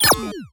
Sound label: switch off sound